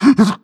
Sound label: breath short, zombie